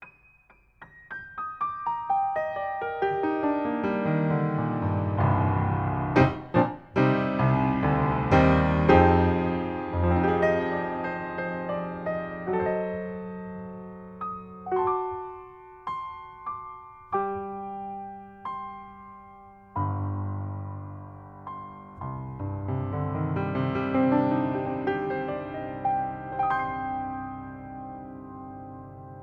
Piano, Front To Back Mic

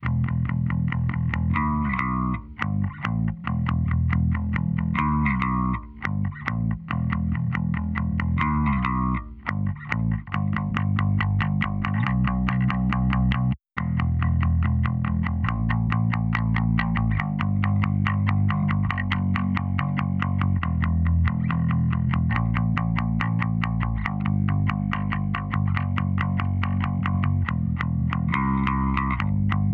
Gtr A Bass

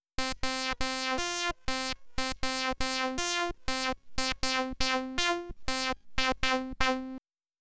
Synth No Shift

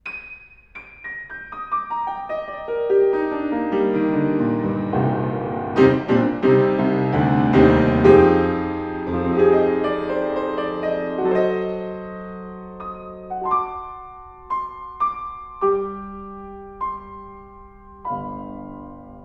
HF Beam Piano Front Half Open

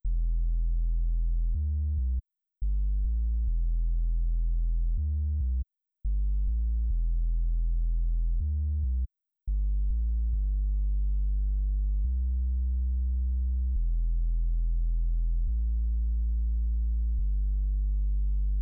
Gtr A Sub

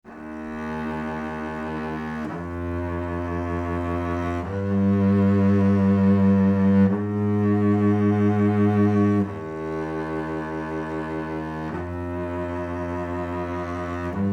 Off Axis Closer

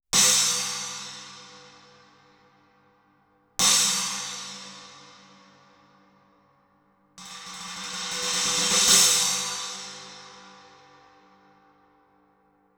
Cymb China Close; Close Mid

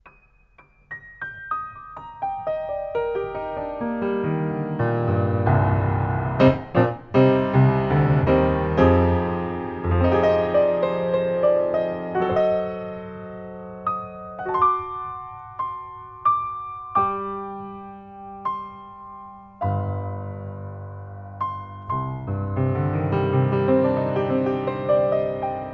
Distance Piano Far

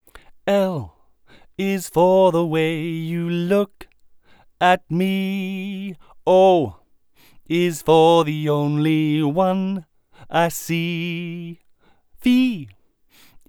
Pattern 0deg Omni Cls

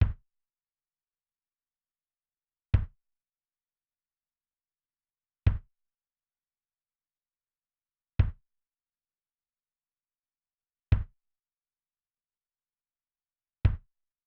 Kick Para Gate

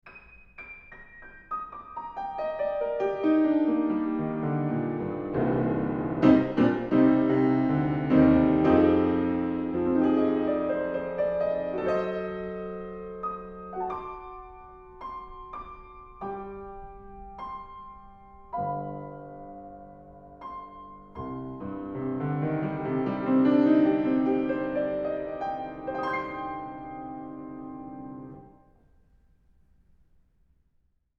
Piano, Horiz Closed Mic

